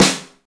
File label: snare drum f sharp key